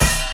good open hat sample A# key, open hat sample tuned to the A# note